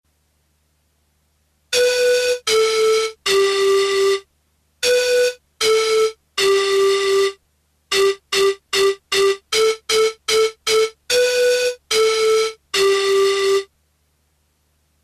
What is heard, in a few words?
Hot Cross Buns Melody